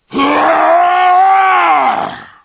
backstab guaaaaah; superheros; the hulk

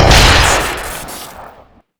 shoot crit; tf; tusken

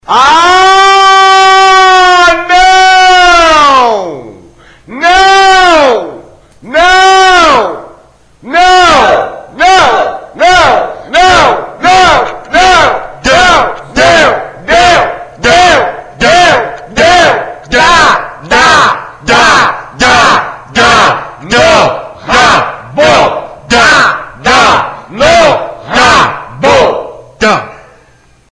aaaahhhnnnaaaooo

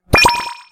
beep; microtf2

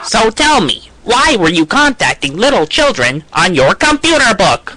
children; tf; saysounds

